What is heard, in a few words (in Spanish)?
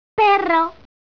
Perro; Zeta Multimedia; Nena 2. Perro